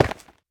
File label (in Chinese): 玄武岩破坏声